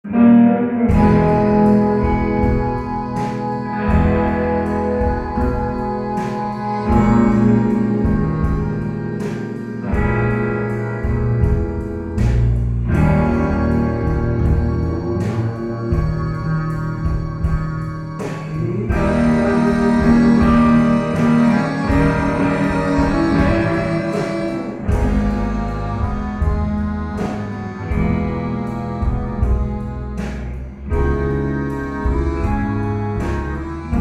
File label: stereo reverb wet, This is only the instrument in the room without the original sounds. It sounds like something you would be hearing coming from a room far away.